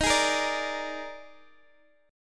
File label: D sharp Major 7th Suspended 4th, Guitar, Open D; x,18,18,16,17,x; chordshape